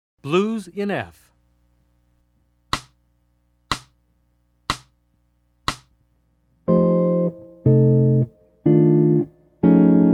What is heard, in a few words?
Jazz; jue shi ji ta